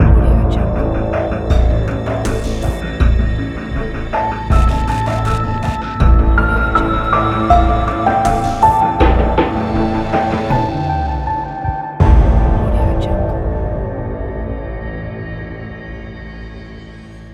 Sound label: crime logo pack